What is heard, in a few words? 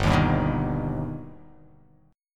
G Augmented G, chord; G+ strummed; 3,6,5,4,3,x,x,x; JGuitar